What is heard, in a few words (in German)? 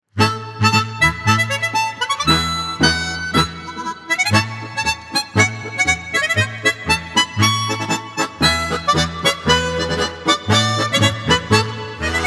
marsch; Erzherzog-Albrecht-Marsch; M: K. Komzak